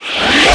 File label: ui swish in